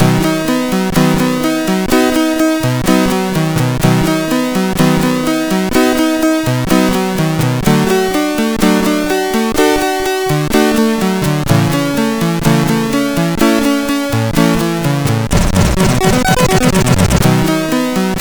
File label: Orchestra, CSG8580; hvsc